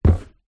foley footstep single wood loose slight rattle boot; zapsplat